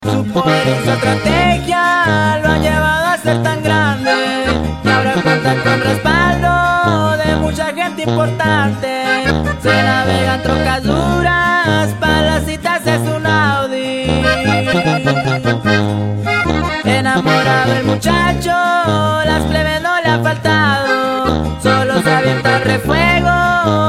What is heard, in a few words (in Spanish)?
Corrido